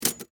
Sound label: Typewriter Key Stuck, Technology, Typewriter, Key, Stuck; Smith, Corona; Typing Sound Effects